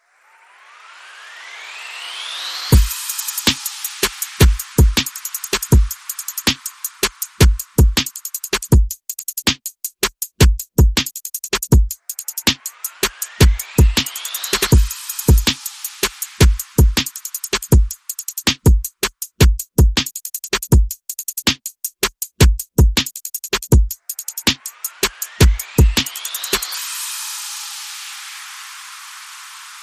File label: Perc and FX